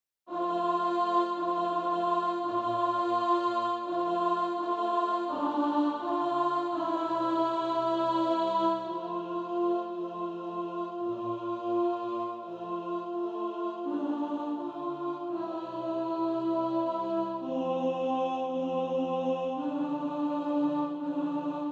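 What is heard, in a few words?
alto, SATB Practise Music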